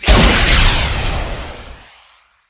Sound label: tank missile fire, strogg; Quake 4 Alpha; id software